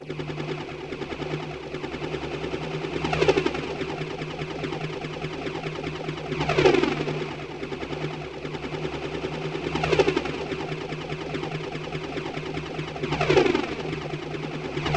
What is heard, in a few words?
helicopter